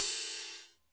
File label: Cym Flat Ride, Drum Machines Alesis D4